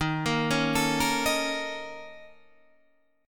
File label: A sharp Minor Major 7th Double Flat 5th D sharp